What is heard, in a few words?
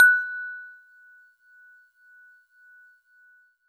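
Celeste DX100 F#1; Pristine Celeste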